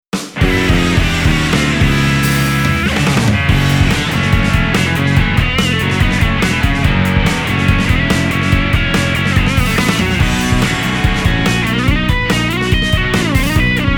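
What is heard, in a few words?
bluesy lead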